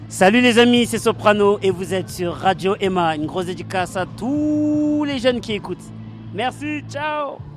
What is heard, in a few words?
jingle soprano